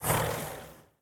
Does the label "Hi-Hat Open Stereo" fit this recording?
No